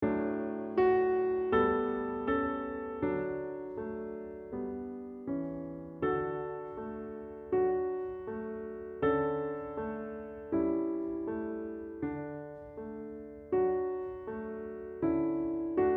Accompaniment; Lightly Row Little Bird; ViolinSchool

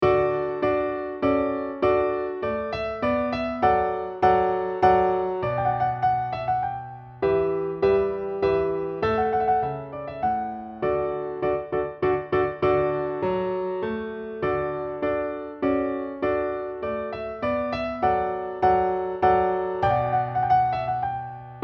Trumpet Minuet Accompaniment; ViolinSchool

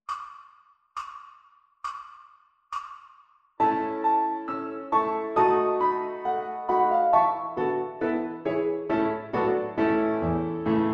Backing Track; ViolinSchool

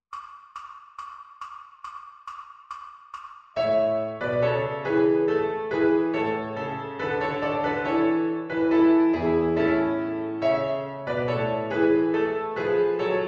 Piano Christmas; Deck the Halls; ViolinSchool

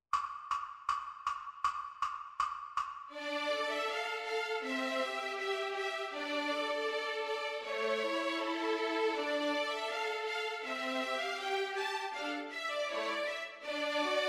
Backing Track; Bransle de la Torche; ViolinSchool

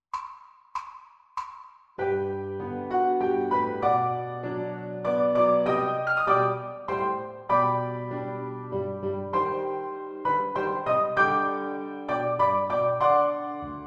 Piano Only; ViolinSchool

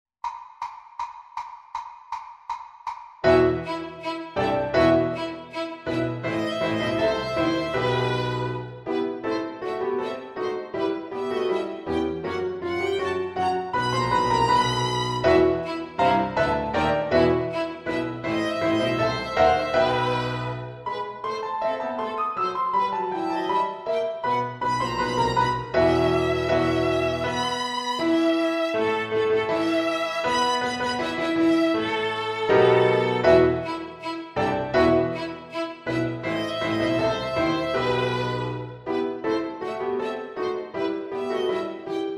Backing Track; ViolinSchool

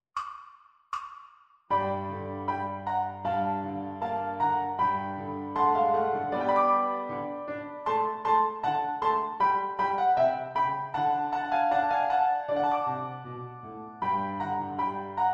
Piano Only; ViolinSchool

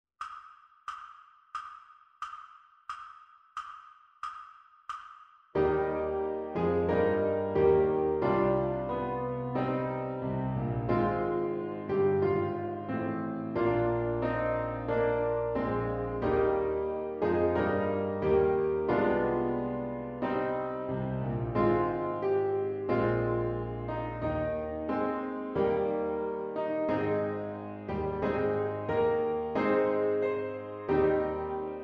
Piano Christmas; In the Bleak Midwinter; ViolinSchool

